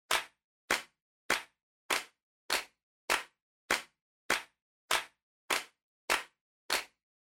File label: Handclap, Slow